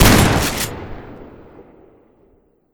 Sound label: npc shotgun fire; weapons